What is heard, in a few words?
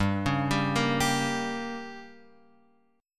G Diminished; Gdim Chord {3 4 5 3 X 3} arpeggiated